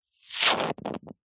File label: foley liquid suck hard fast foamy frothy slurp